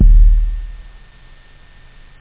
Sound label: HEAVY-DUTY-BASS